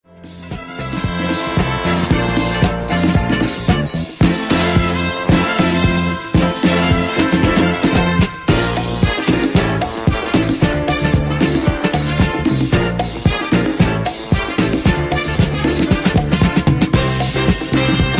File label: Brass Disco; tk records